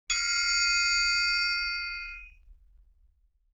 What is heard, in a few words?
LoFi Triangle Hit; Vintage Cartoon; Drums & Percussion